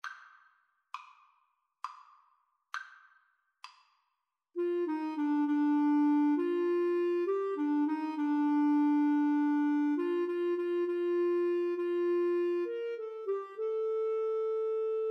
Flute Clarinet Duet

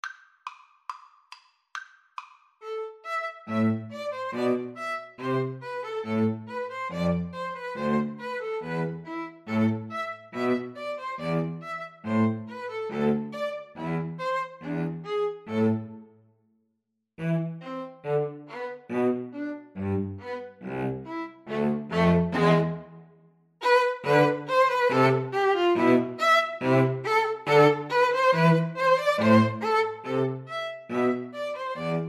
gavotte, String trio

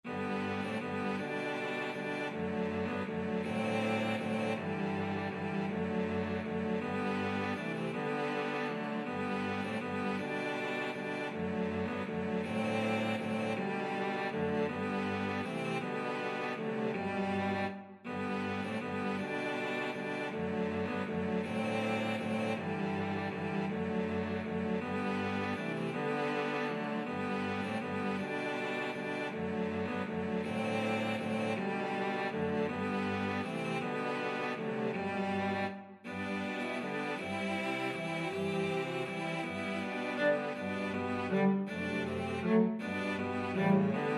cello quartet

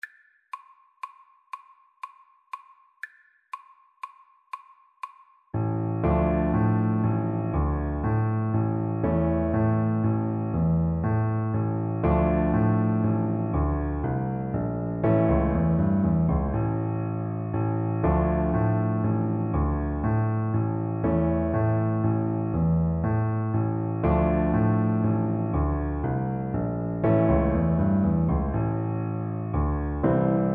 trumpet

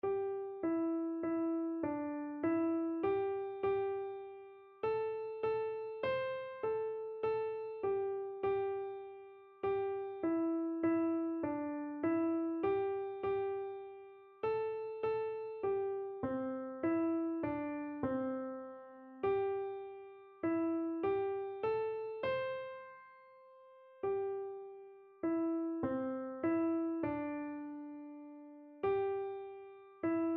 jesus loves me, keyboard

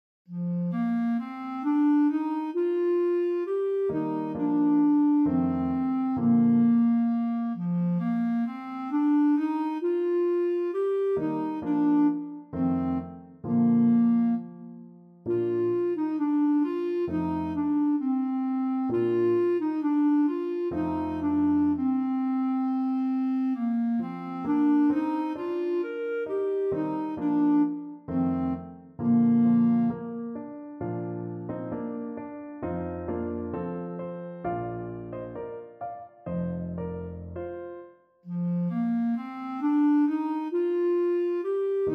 ein mannlein, clarinet

